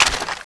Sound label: clip out, weapons